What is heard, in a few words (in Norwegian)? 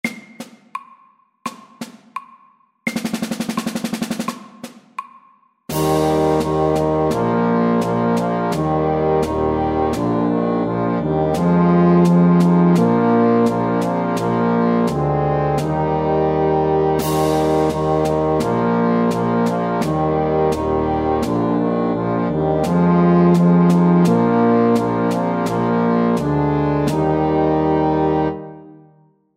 Elefantmarsj MEDIUM 85